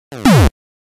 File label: p switch stomp